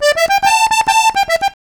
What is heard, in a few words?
Accordion Lik Am; Zero-G Kocktail Kollection; Accordion Lix